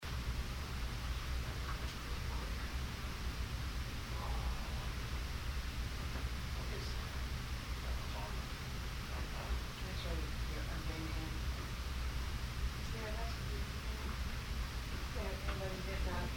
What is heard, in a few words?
breath; Spfld